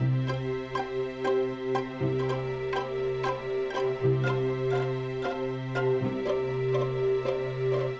Classical, hold music; Gizmo

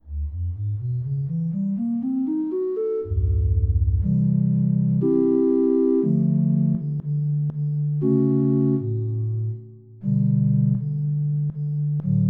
Ocarina; EverQuest

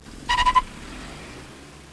squeel, cars; transpo

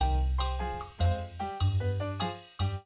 Salsa Beat, ringtone

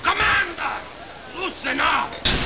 STATIC; Babylon 5; Londo

